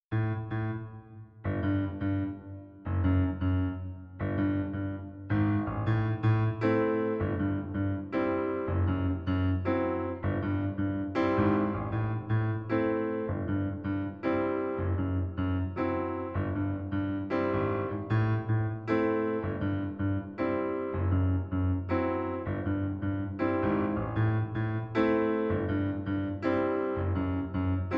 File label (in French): new york ballad accompagnement